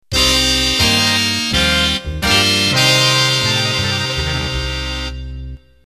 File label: Big Band Slow End